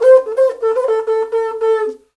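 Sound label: Afro Horns, horn phrase; Af.Horn Phr; Roland LCDP11 Africa VOL-1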